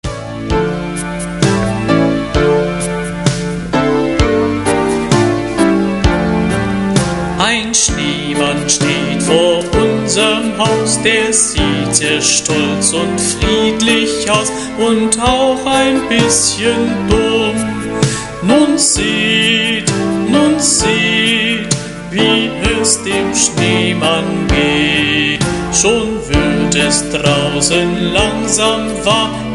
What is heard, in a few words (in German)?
Schneemann Gesang